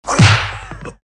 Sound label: FIGHT KICK; BRUITAGES